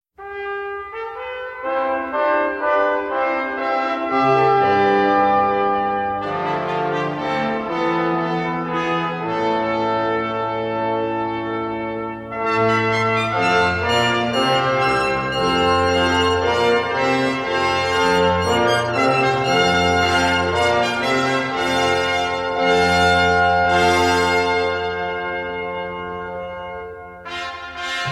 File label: Trumpets Fanfare, music on hold